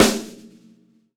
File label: Acoustic kit; Akai MPC2500; Drum-Percussion